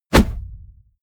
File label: push